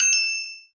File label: Message